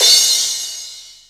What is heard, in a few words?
long tail reverb crash sample C sharp key